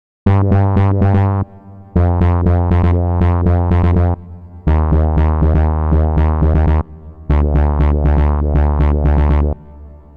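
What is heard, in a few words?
gate 16 steps mirror q; the meditation soundset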